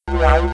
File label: Laser Loop; Playable Tutorial Level